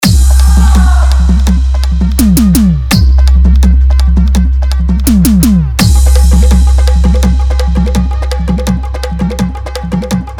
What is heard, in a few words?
Bhojpuri Hard Dhollki Bass Mix; DjAnurag